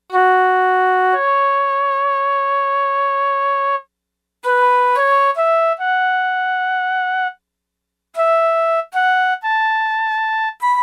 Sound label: Small Hall, Lexicon MPX 1; Factory Programs